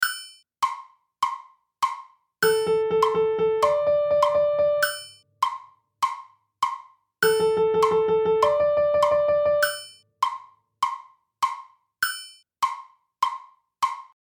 quintuplet septuplet

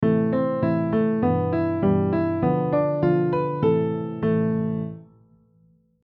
scale melody minor

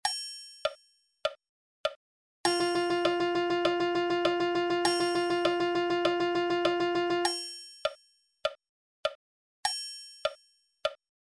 music 2 notes

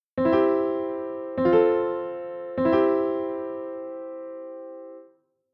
chord progress c f c

